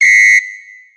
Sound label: whistle, electronic instrument